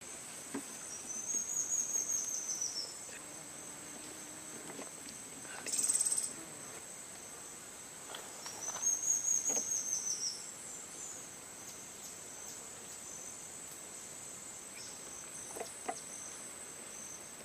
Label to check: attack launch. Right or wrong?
Wrong